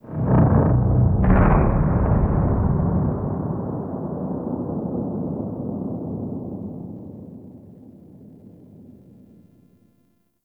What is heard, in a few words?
Gas City ext distant seismic explosion; Warframe; Corpus Gas City; Ambience